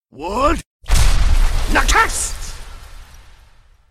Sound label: speed up; freak fortress 2; dragonborn